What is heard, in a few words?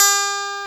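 guitar Strat, Brite Strat, clean electric guitar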